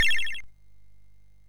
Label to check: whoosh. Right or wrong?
Wrong